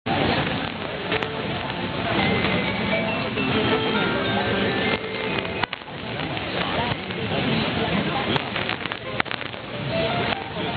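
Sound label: laser; 730 HJCU Melodia Estereo Colombia chimes 0559 UTC 7-2-15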